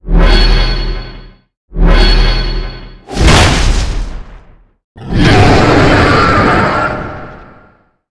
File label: Dragon Skill; Seer; GamigoNA